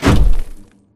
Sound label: Small Concrete Wall Stop; Boomtown; Titanfall 2